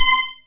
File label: SPIKE SYN